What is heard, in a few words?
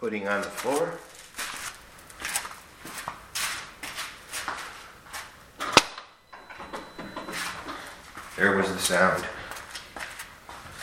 sound passenger rear wheel well; volvo recharge models; enhanced